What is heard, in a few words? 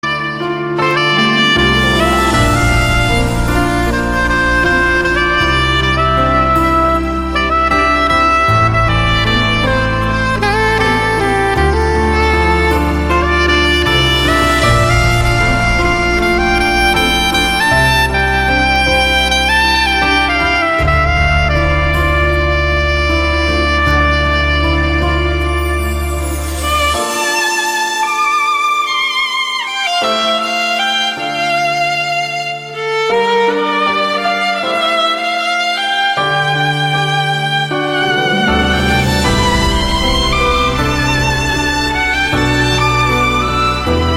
Saxophone